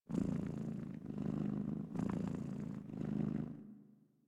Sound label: Catbrow Kitten Purr; Warframe